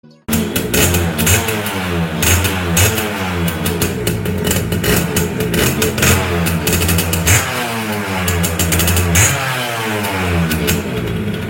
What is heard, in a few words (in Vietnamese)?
Tieng xe xi po